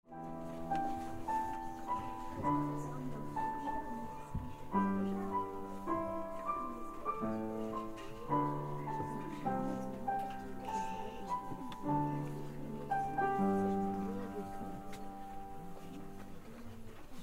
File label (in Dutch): vredeslied piano